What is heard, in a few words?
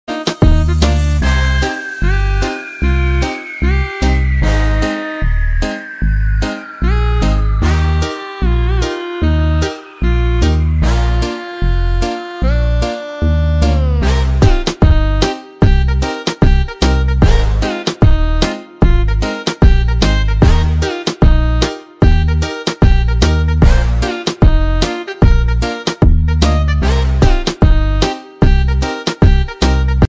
Dance Ringtone Siren Beat; Tiktok